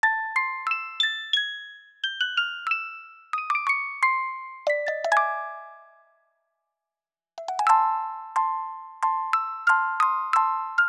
Tango, Music Box; animal crossing new leaf welcome aamiibo soundtrack

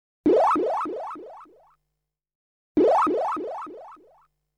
(Sound Effect) Go to Jail, String Quartet

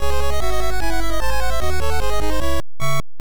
Death; Aliens 2

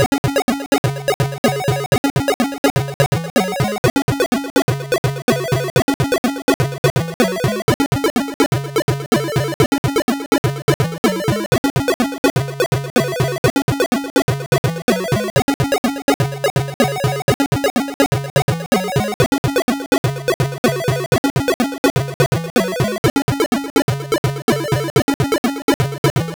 Jungle Level

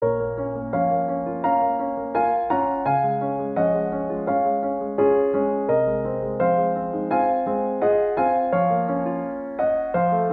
Piano Theme; Cubemen 1; Cubemen 2

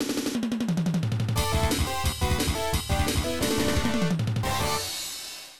Scene Start; Wally wo Sagase; System 18; arcade

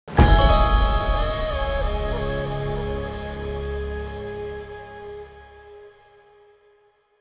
Torch Sword Combat Outro; inkwater Marsh

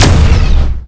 tower shoot; guns n zombies 2014 pc

